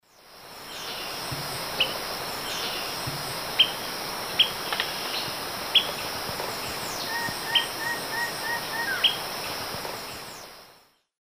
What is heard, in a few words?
Empidonax alnorum, call; S. America; Eastern Lowlands